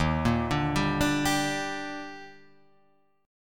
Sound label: D sharp Major 7th; arpeggiated; JGuitar